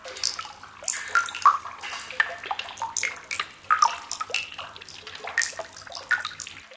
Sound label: drips; Source Engine; tf